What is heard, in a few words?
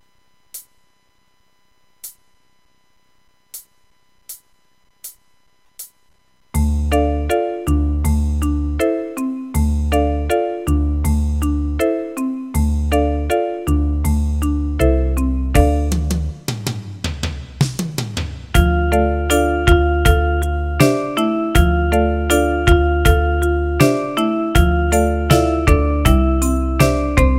80 bpm; einfache stuecke